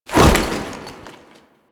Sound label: Foley Melee Sweep; Warframe; Kahl